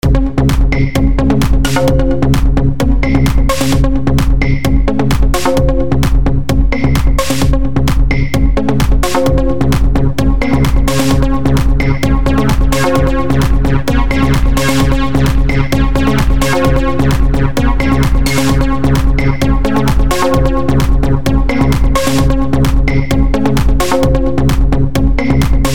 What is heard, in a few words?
superfat jungle loop